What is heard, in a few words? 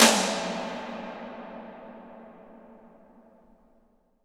toms, cinematic impact, drum